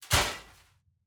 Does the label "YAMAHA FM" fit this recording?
No